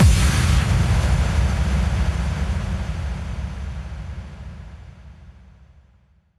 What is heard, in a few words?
Hits & Explode